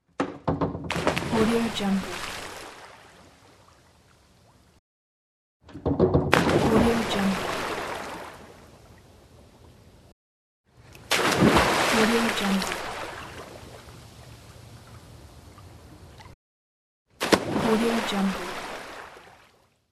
diving

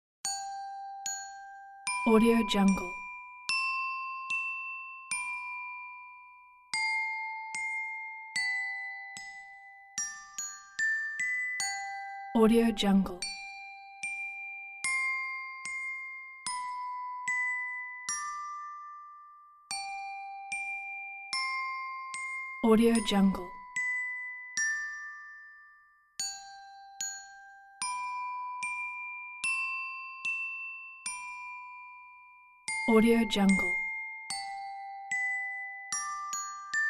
twinkle twinkle little star

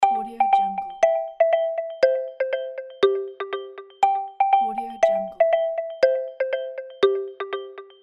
game time waiting sfx

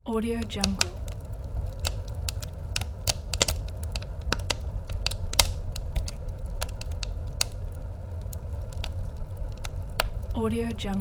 the fire countyside

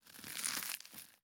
crackle rip tear celery

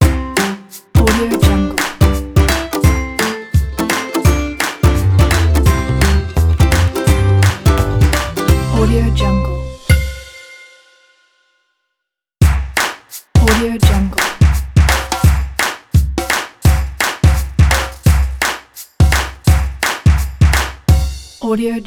happy claps acoustic ident

